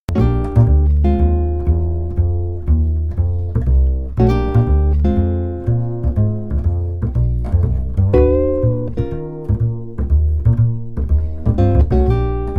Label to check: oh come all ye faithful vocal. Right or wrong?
Wrong